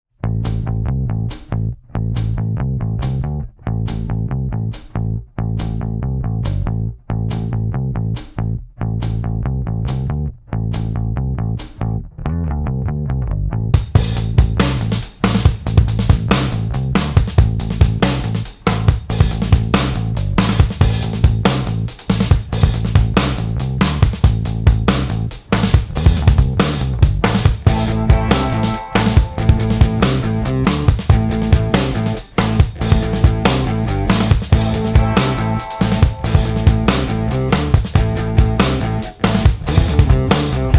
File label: emotional music